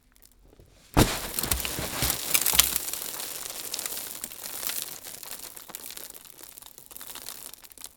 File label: Hit With Dirt Spray